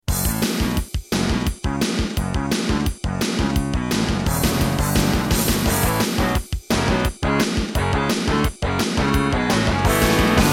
Intro, Hizon DB333; Duke Nukem 3D

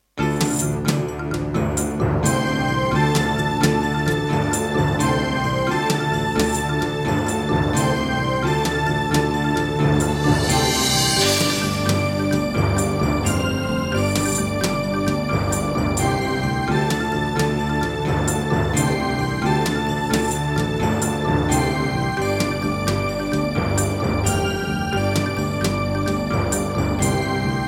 Pine PT-2030 Vivaldi Xtra Wavetable DB; Heretic

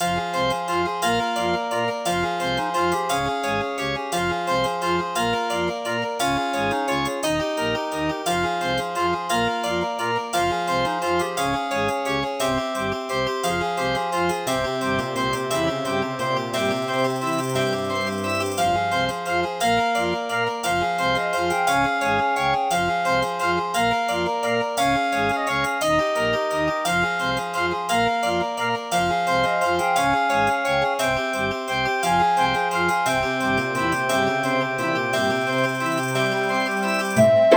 Track 6, LG MIDI ART GS1000R; Death Gate

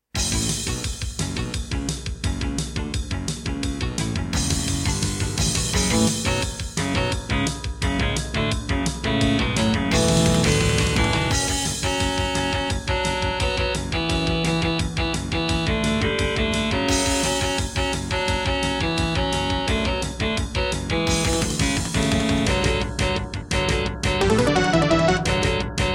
Intro, Serdaco Crystal Blaster C1; Duke Nukem 3D

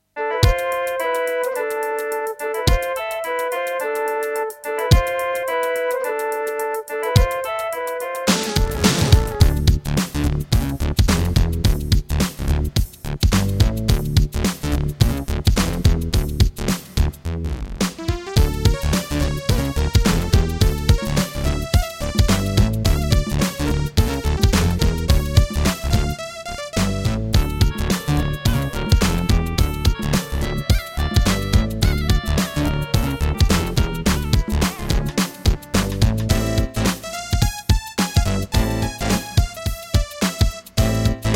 Serdaco Dreamblaster S1; Lost Vikings

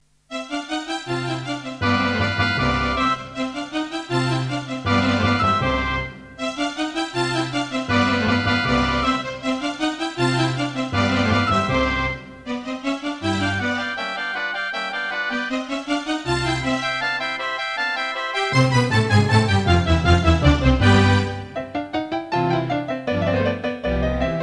Midiplus MiniEngine USB; classical; Mozart; Concerto K467 partial

